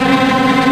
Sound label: tremolo strings C3; TREMOLO STR MIX